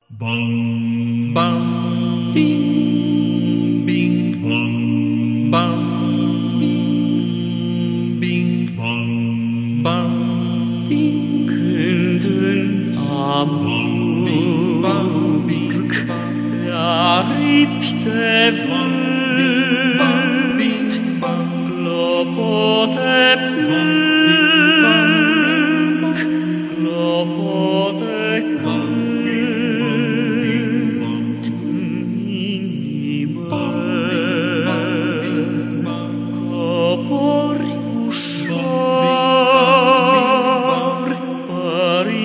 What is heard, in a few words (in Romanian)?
voice clopote